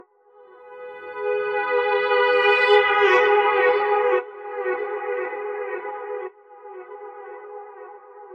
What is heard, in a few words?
Amaj Gtr Reverse Fade; Zero-G; Future Wave